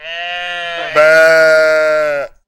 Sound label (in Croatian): ovca; animals